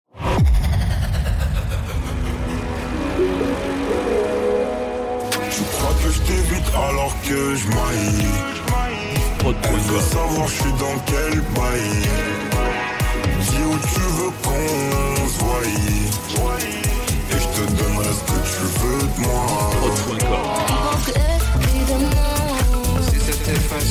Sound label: Medley Urban